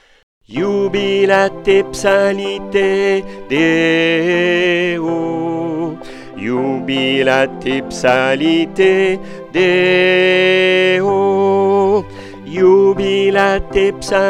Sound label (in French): Coda ALTO